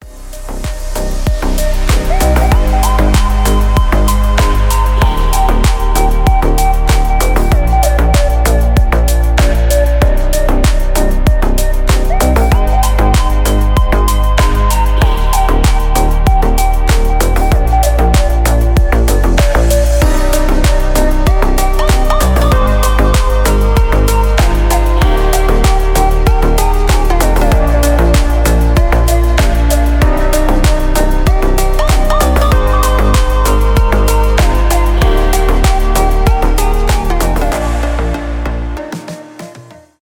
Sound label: instrumental mix